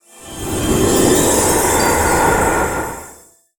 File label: Portal; azaraka